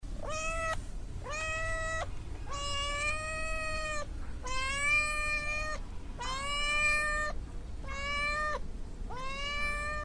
Cat meoowh

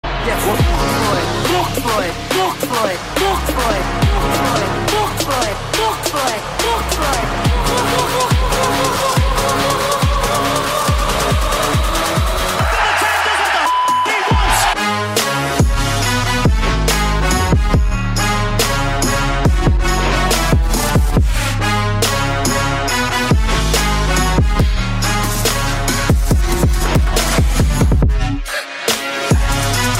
Trap; Conor McGregor

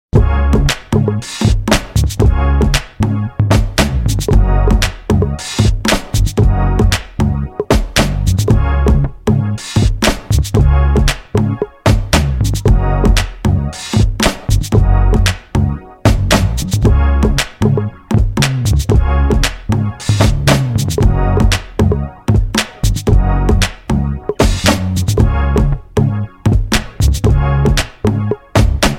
Lovely Notes

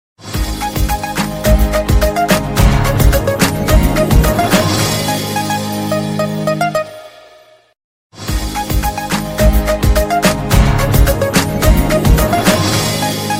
vestel ringtone

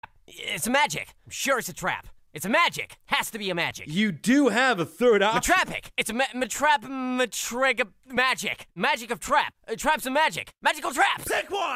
Trap

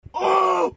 "OOOH"

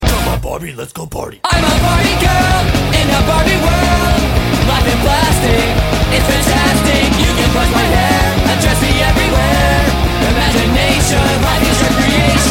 Rock; Barbie

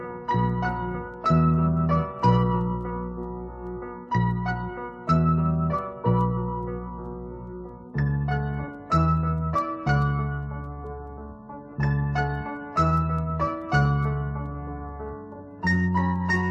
Piano

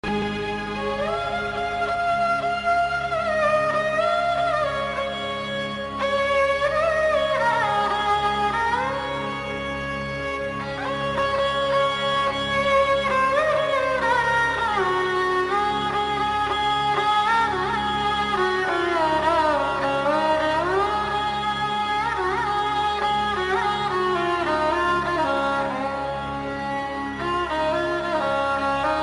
Violin

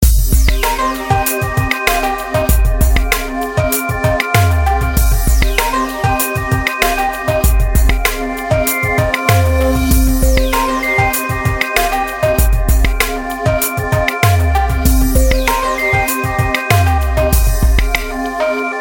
Corporate

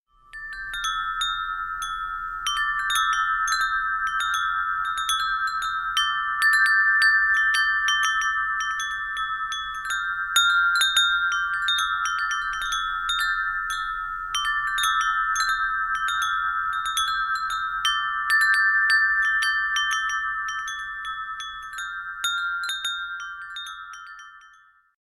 Celtic Chimes

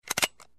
Gun Lock Sound

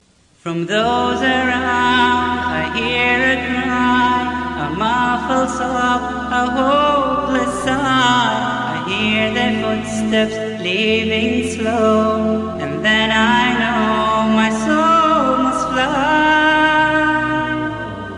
Last Breath

